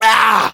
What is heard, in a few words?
pain sharp, fr; Demoman; Douleur aiguë 5